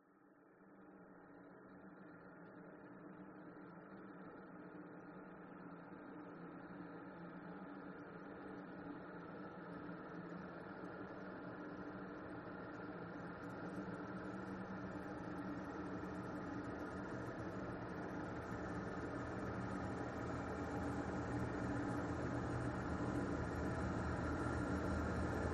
sound design drone texture building bright fuzz futuristic; scott lawlor